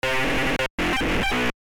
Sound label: dog saw; random and out of place